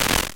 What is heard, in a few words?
Glitch; Puddle